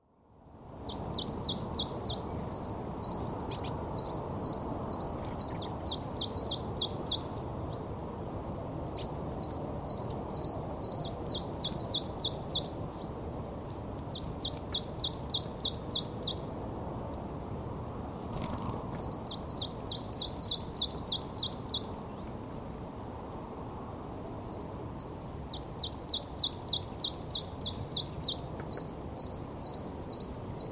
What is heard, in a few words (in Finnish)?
kuusitiainen; linnut